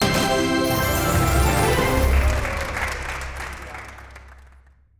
jackpot win

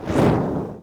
symbol hit stacked

